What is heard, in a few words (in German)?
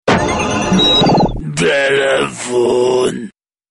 Telefon